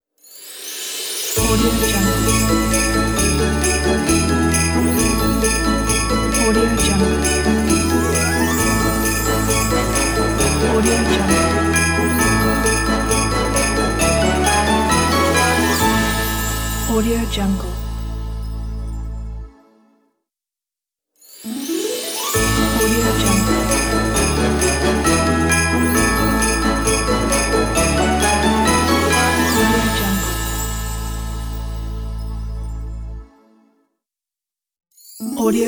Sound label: christmas logo